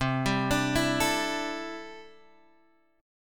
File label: C 6th Add 9th, C, down, Guitar, Open G